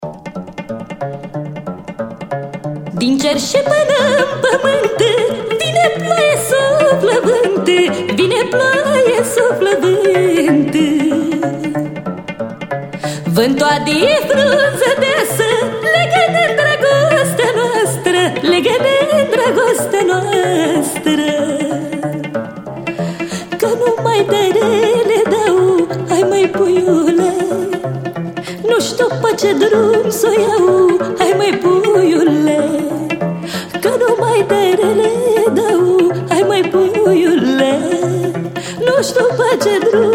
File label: RAIN CHANT; Female Vocal